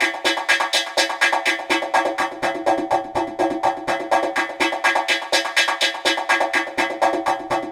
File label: Echo Chuff, Synthetic Pulses